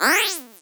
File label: Multimedia Internet CD-Rom Flash Animation Cartoon